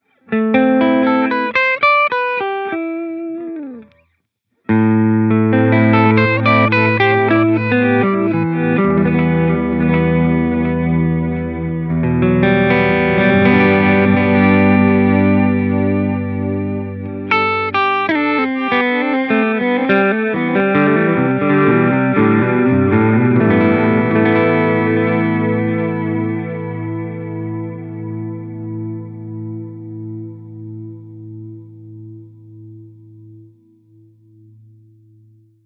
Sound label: Ambient Triggered Swell